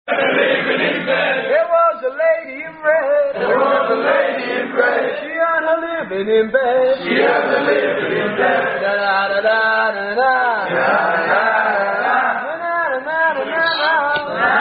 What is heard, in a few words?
Unknown Bawdy Rugby Song; Lady In Red, Lady In Green; Brighton Rugby Lads